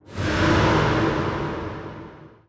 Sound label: flinch wet, strider damaged; creatures